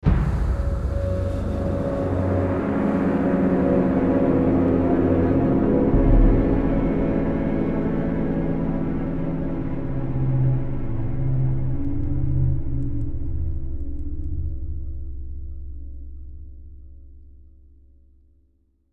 Eerie Stinger

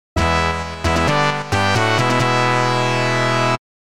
Game Over MUSIC; the return of ishtar original soundtrack

